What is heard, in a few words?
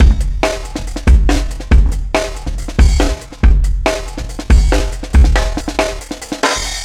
Marching Kit, Breakbeats; Drum n Bass; Beats and Percussion; KJ Sawka; Drum n Bass & Beyond